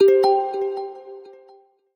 Zen, notifications; Honor; 600 Pro China

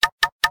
Knock, notification; LG; Escape-P870

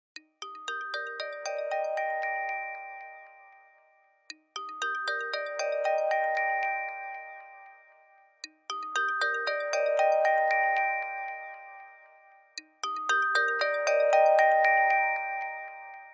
Alarm, Nokia tones digital; N78

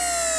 Camcorder Zoom Out; Samsung SGH-i550

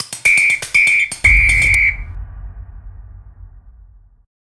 SMS; Acer Liquid E1

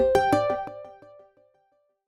Bloom, notifications; Lenovo; ZUI 13

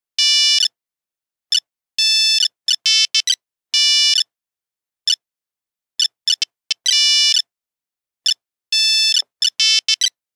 Funfair, Nokia Buzzer; Windows Phone apps

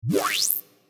Electricity open; Nokia; audio themes

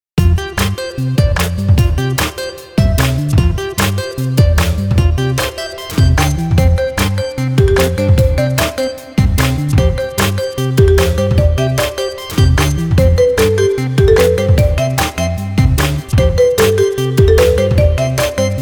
ringtong; Gionee V185

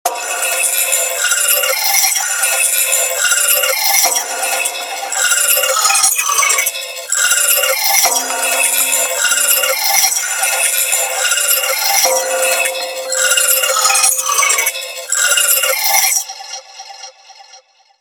Harsh, ringtones; Motorola; Droid Pro

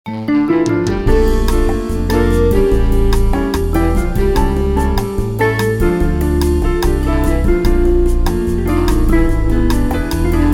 Bossa Nova; TP-LINK; Neffos C5

